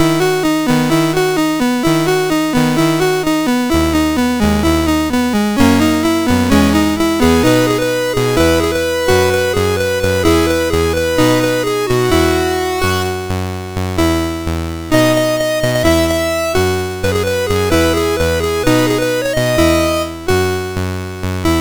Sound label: MOS6581R4